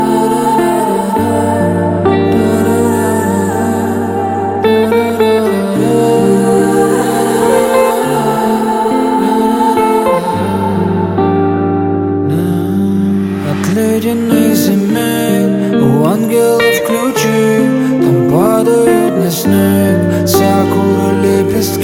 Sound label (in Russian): rington